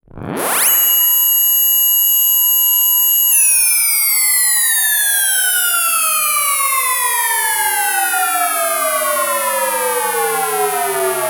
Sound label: FX, EMS VCS3; Polyend; Simulacrum Sounds; 1 Shots; Synths